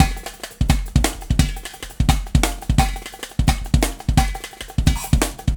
Warp Drive Beat, Processed Drumkits; Sonic Foundry (Sony Creative Software); Processed Drumkits Zero Gravity Beats

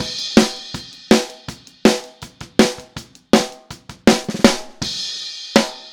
Snare, Drums; Drums from the Big Room The Mixes; Take Your Toms Off; Chorus 04

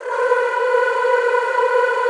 B3, Extended Classical Choir; Best Service

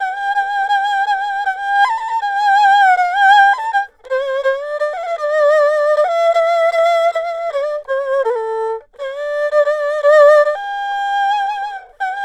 ERHU 90 C; INIS - Opium

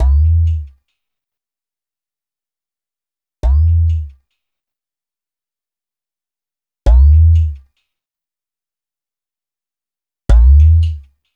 CLAY POT; Spectrasonics; Liquid Grooves 1 & 2; BUBBLY

